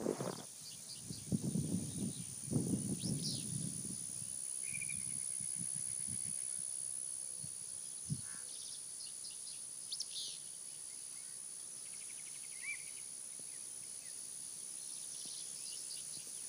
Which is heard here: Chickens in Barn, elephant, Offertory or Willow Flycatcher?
Willow Flycatcher